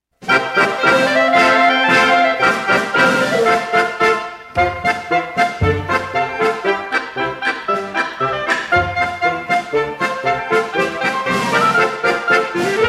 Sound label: instrumental